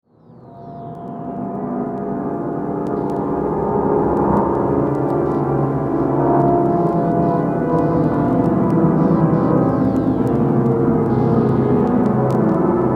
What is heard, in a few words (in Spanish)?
musica electroacustica